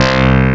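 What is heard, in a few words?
analog clav C2, analog synth; AKAI S6000 CD-ROM - Volume 1; SAMPLE WAVE MIXING 1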